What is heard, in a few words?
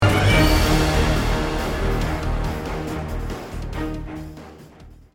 Defend Lose; Disney Infinity 2.0 Marvel Super Heroes